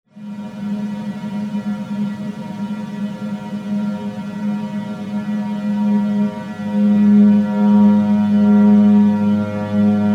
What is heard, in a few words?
VZWD RAIN